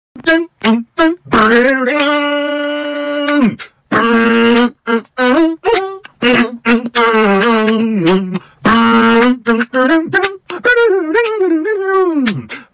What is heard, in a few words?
bossfight beatbox; bowser